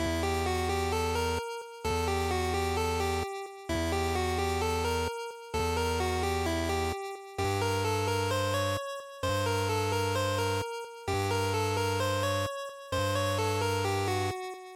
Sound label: Dungeon Loop; GB RPG; bgm